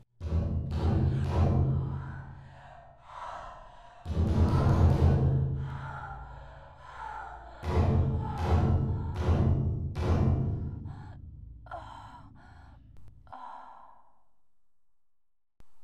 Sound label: maid dies; Lucius